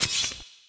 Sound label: ui